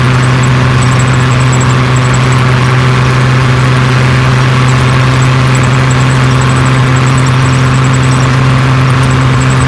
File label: engines